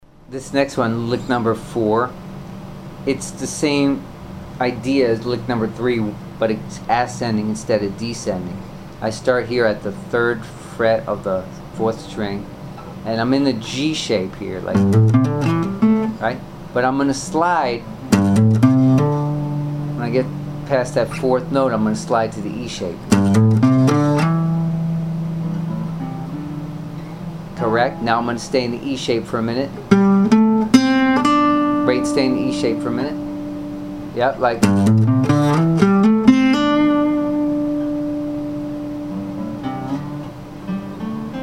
licks using slides

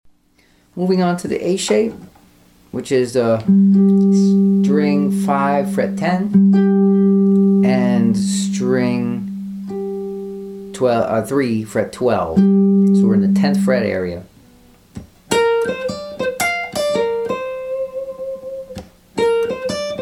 easy blues licks key G shape A

